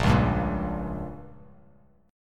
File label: Bb Minor 6th, guitar; strummed; JGuitar